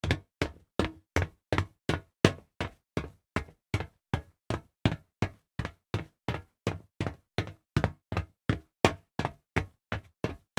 walking fast over hollow metal

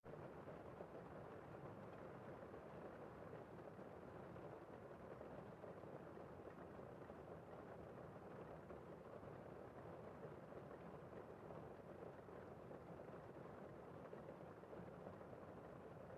rain heavy quiet interior

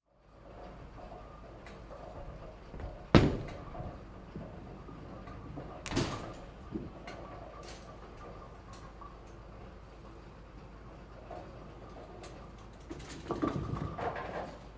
bowling miss pins

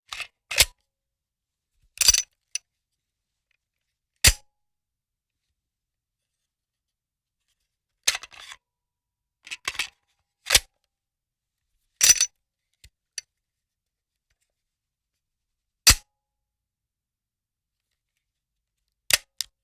gun reload

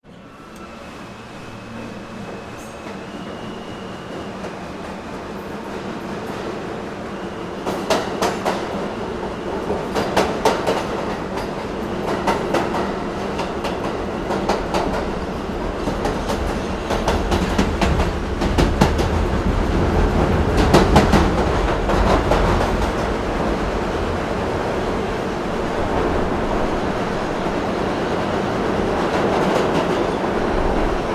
subway nyc in motion

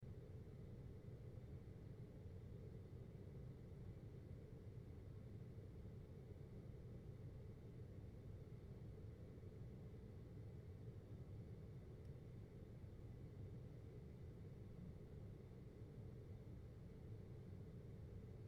ambient hum air conditioner

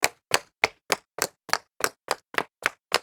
jog up concrete stairs

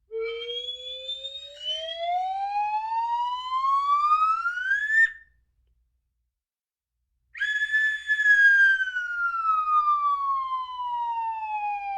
slide whistle rise and fall

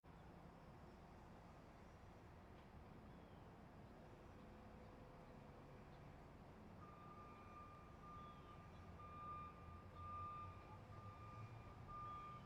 truck beeping

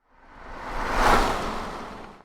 wet tire drive by

